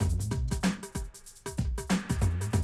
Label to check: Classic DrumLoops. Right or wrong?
Right